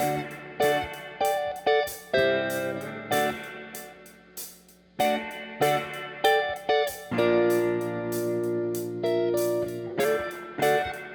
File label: no bass; Pledge Music Axe; Seafoam